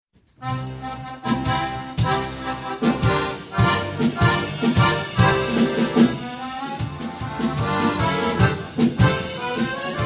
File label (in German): Melodie Rhythmus